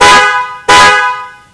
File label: klaxon